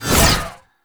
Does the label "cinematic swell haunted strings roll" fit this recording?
No